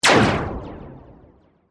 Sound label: Laser Shot Synth, Delay; Large, Science, Fiction